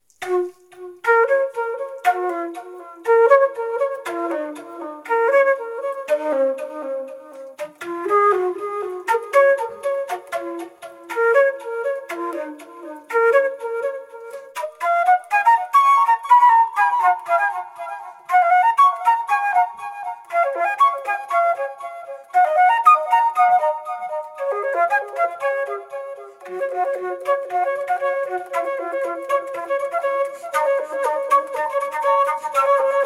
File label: Rondo Delay, flutepower